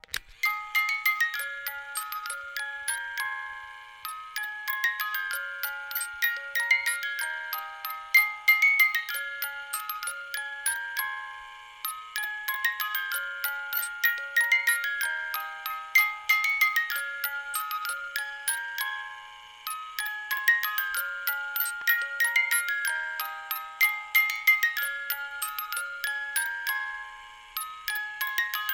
Clockwork Toys Music Box We Wish You a Merry Christmas Tune; Skyclad Sound